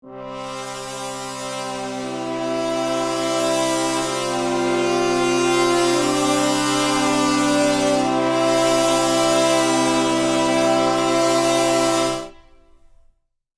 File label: Cartoon suspense brass rising tension; cartoon ascend